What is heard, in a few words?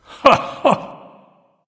hehheh; smite; ganesha